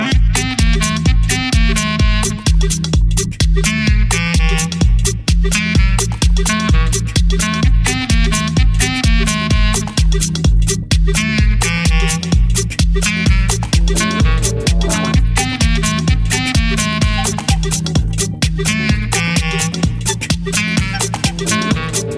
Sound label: Klezmer